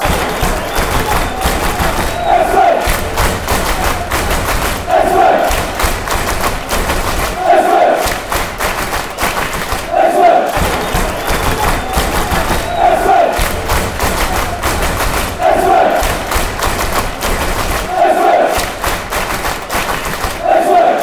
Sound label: clap fan chants; ipswich